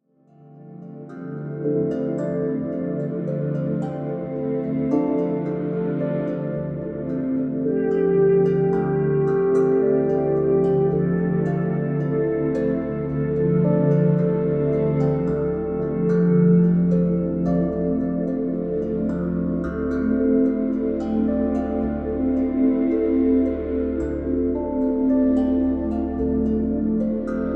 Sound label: Meditation 3D RELAX